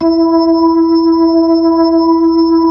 B-3, Brite Ch. SL; Roland LCDP10 Keys of the 60s and 70s 2

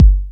JD800 Dance Card Drums

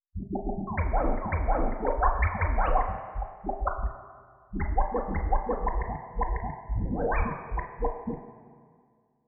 wipwop

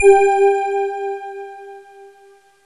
WALDORF MW; Rubber Chicken Software Sample Bank

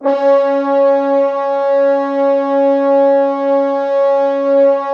Orchestral Brass

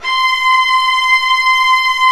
Vla C5; Digital Sound Factory Virtuoso

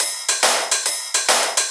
Shuffled Loops, 140 BPM

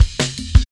Jazz Ride A Cut, Drum Loops, 165.5; Jungle; Zero-G Jungle Warfare 1, 2 & 3; 90 s Sample CDs

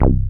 DM BAS, Dance Mega Synth

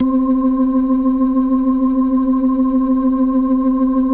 C4F, B3, Vintage Keyboards

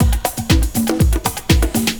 120 HOUSE, drums

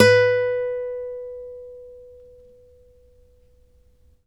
ACC FG B, Acoustic Guitar; East West; Steve Stevens Guitar Samples Collection

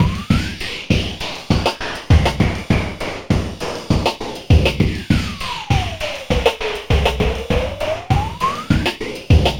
Wah Loop; Sonic Foundry (Sony Creative Software); Esoterik Beatz

